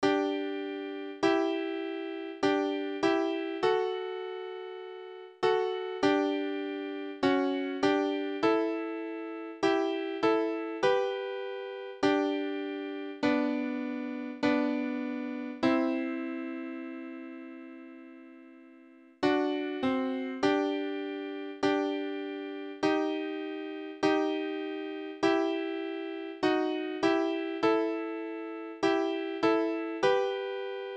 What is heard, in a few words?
melody hi harmony